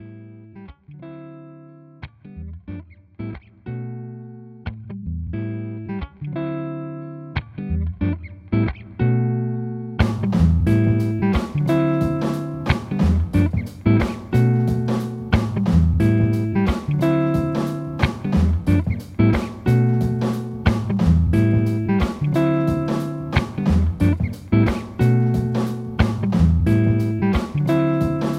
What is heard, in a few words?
short break, lofi